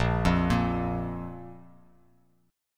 Bb 5th; Bb5 arpeggiated

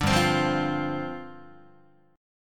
G 7th Suspended 4th G down Guitar; G7sus4 strummed

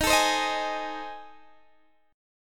A Minor Major 7th Flat 5th Eb

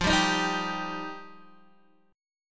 F 7th Suspended 2nd F Guitar DADGAD; F7sus2; x,8,5,8,6,5; strummed

